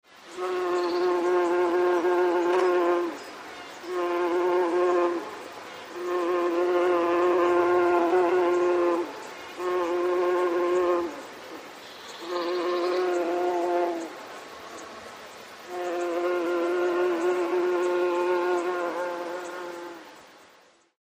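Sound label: bee buzzing, forest sounds in the background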